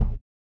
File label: GLASS; Spectrasonics; Metamorphosis; GLASSBABY